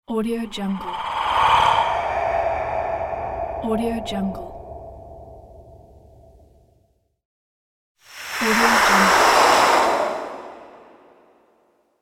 ghostly breath

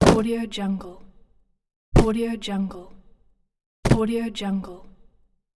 object fall

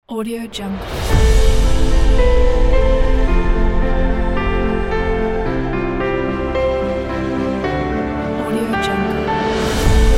inspiring; magicmusicstudio

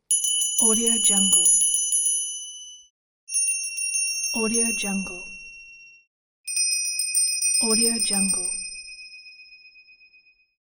bell ringing; greenwaysound

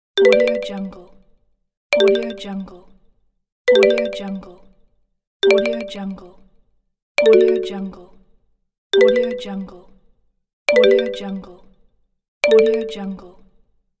marimba message alert

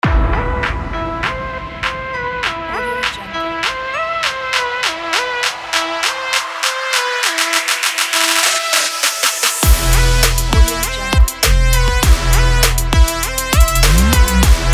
trap

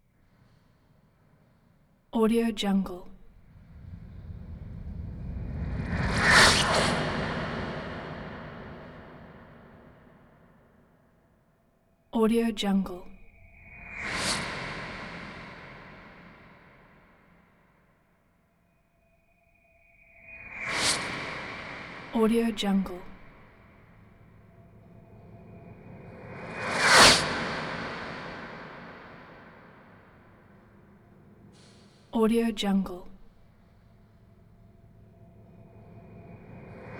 time travel approach in fast pass fly by

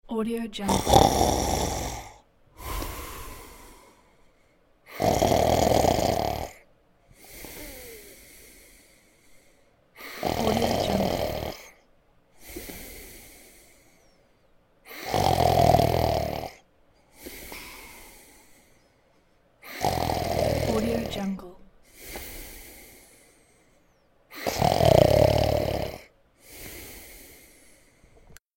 snoring

